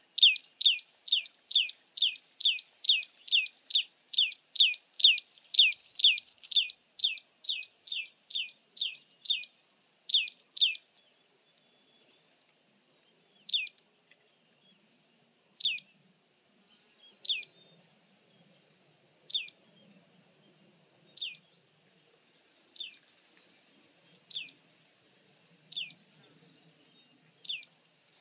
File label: Hill Prinia Prinia superciliaris, hill prinia call